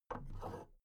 big plastic case handle take soft

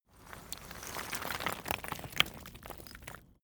stone small landslide short

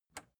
record player power release on

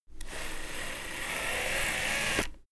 servo move powerful

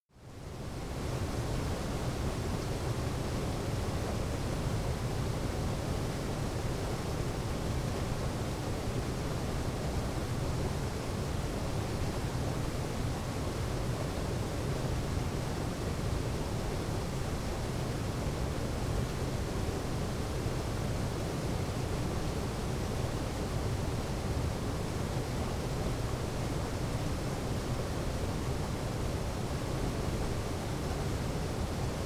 stream estuary

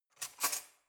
cutlery knife drawer grab

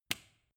switch turn off hard

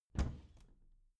bathroom cabinet doors open regular

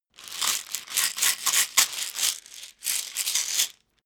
pillbox shake

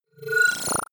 data transfer beam

